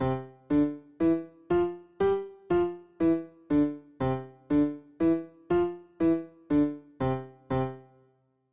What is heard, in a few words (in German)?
Staccato, klavier